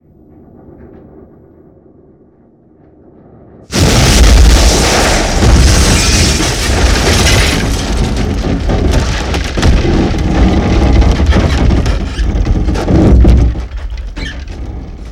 Explosion Structural Expansion; Sony Pictures Sound Effects Series

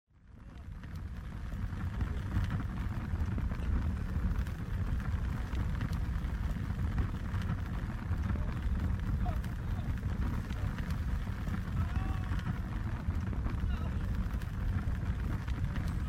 Birds and Burning; Age of Empires III; cinematics

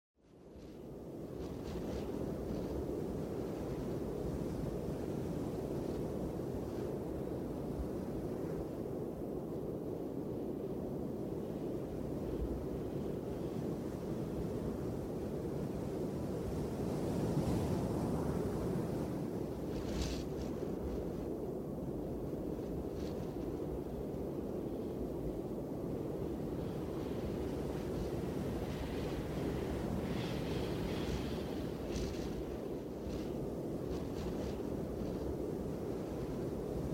Wind; Age of Empires III; cinematics

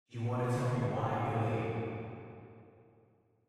Reverb; Age of Empires III; cinematics

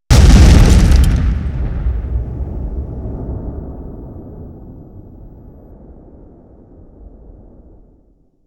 Explosion; Sony Pictures Sound Effects Series; Explosions - 5.1 Surround

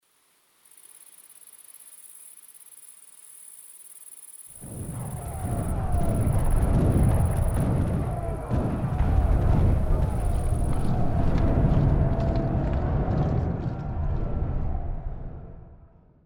Ambience; Age of Empires III; cinematics

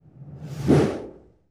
Cannonball Whiz By; Period Combat; Sony Pictures Sound Effects Series

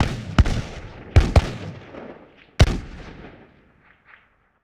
Musket Volley Distant, Period Combat; Sony Pictures Sound Effects Series

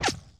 Bullet Whiz By; Sony Pictures Sound Effects Series; Ricochets - Hits - Whiz Bys

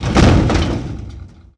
Body Fall On Metal, Hand To Hand Combat; Sony Pictures Sound Effects Series

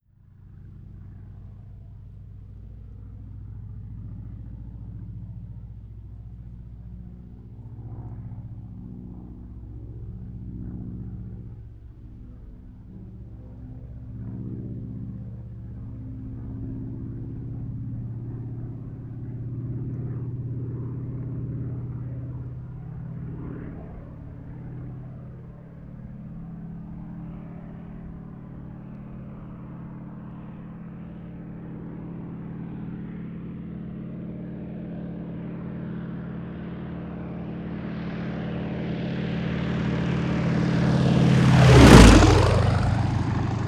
plane fast by; Vehicles; Aircraft; Brossard; Sony Pictures Sound Effects Series

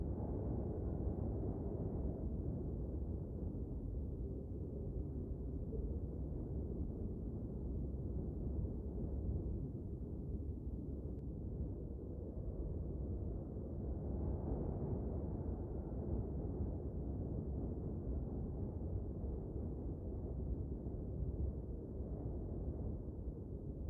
wind; RYL 1 v5.57

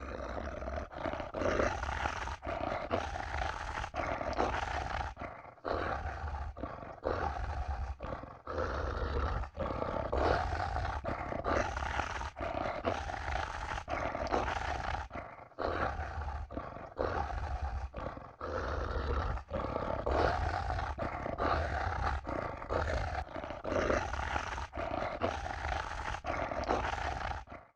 Snarling & Breathing, Vocals - Monster; Sony Pictures Sound Effects Series

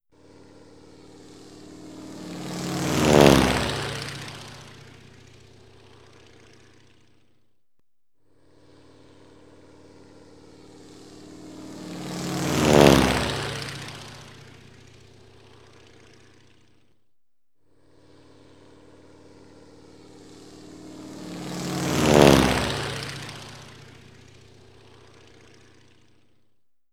Prop Plane Bys; Sony Pictures Sound Effects Series; Vehicles

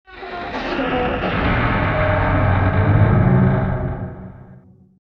Roar & Scream, Vocals Monster

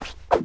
Swing Weapon

